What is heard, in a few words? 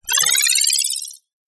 science fiction robotic cyberpunk electronic equipment beep ascend power; zapsplat